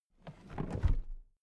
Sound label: toolbox put down regular